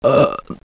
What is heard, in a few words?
SMLBURP